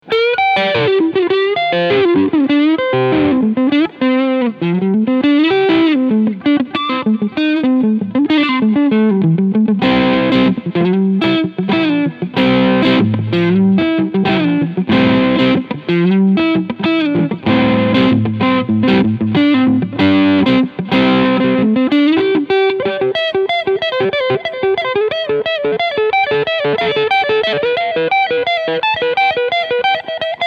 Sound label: Fender Traditional 60s Tele Overdrive Front Pickup